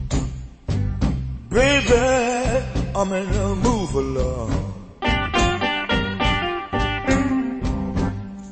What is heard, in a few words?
blues